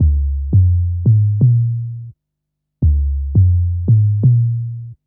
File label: Hammer Bass, Drum & Bass; Fluid Dynamics